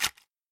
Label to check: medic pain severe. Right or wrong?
Wrong